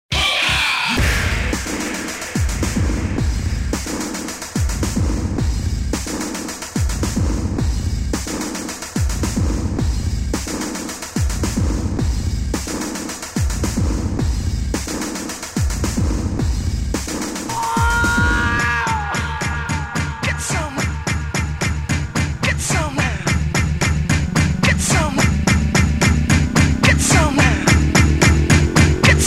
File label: Trap remix